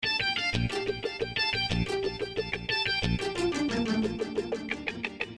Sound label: Gtr & Pizz Echoes 90 Gm7